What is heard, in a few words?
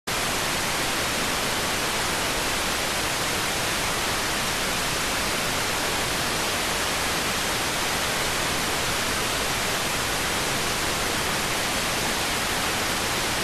rtty rx